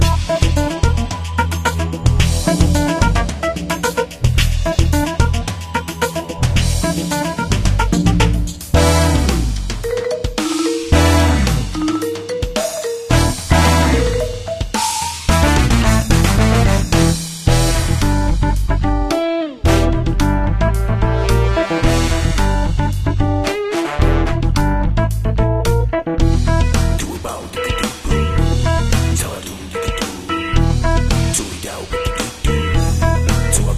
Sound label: Arcade Looped